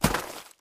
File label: fly landing; kak priruchit drakona; vysizhivat yajtsa